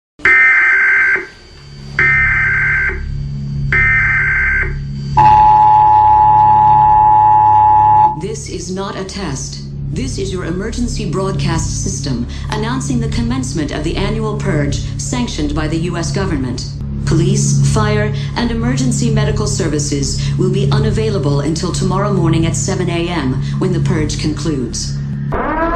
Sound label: announcement trap remix; the purge